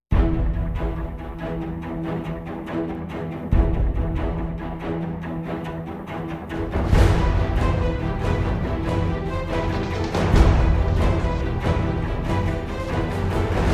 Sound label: Annihilated (No Choir), Epic Builds And Action; Brand X Music